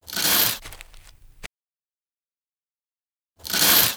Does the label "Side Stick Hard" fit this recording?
No